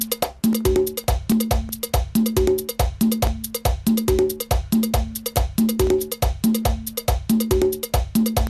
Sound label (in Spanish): Merengue (120 bpm)